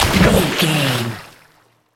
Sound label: water splash object body